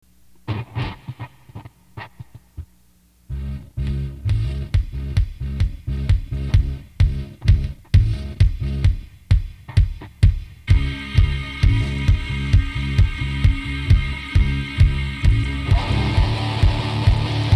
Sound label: Funk funk